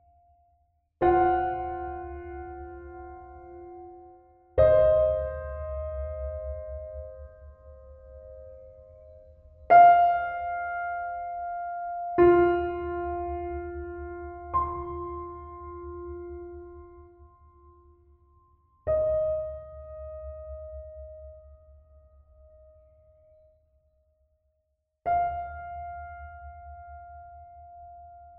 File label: loop piano